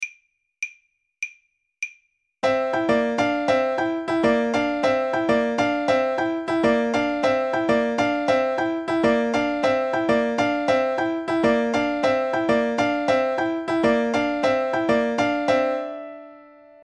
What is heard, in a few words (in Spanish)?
bongos; reciclar tocar